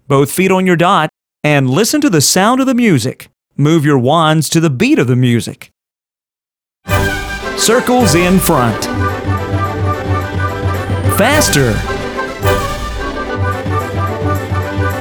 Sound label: Rhythm Wand